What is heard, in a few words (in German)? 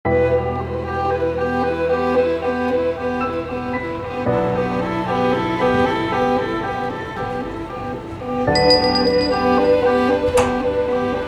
Geschmacksfragen Jingle